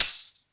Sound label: TAMB, Roots Disco; USB Soundscan vol.32; AKAI